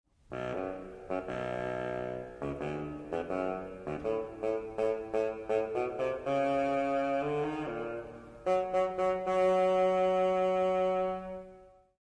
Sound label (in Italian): controfagotto; Pierino e il lupo